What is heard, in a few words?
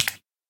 flop, guardian; mob; Minecraft